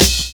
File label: LIVE CLAP; Univers Sons